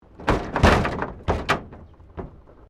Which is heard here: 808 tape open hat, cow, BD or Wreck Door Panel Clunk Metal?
Wreck Door Panel Clunk Metal